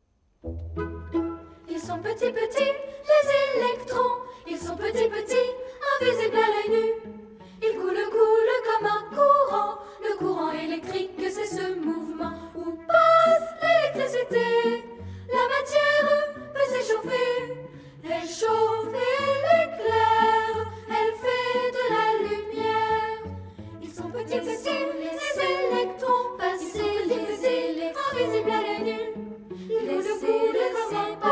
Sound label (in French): chant la comptine des electrons mille tours; edison